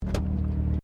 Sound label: CAR MAZDA RX7 SERIES3 INTERIOR IDLE DOOR OPEN; 1983; Cars (Sound Effects:Vehicles)